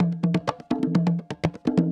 mix percussion loops, 125; Vocal House; PS-28 Vocal House Samples

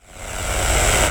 Reverse FX; Vengeance Samples

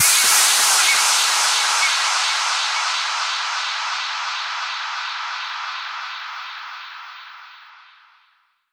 Downsweeps

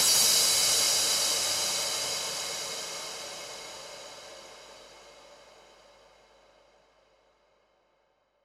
137BPM Crash; Drums & Perc; Amajor

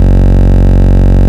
Ultimate Bass Root F#; Vengeance Samples

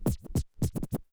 Cuts, Vinyl Record Samples

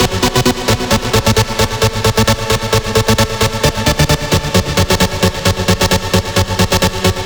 132BPM Elemental Melody

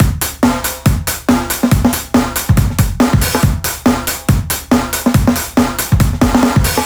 Breakbeat 140 BPM

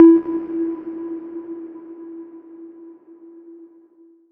Synth Cuts; Vengeance Samples; VES1 Instruments

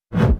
BD Gate FX, Bassdrums; Vengeance Samples

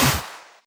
Clap; Vengeance Samples; VEC1 Claps

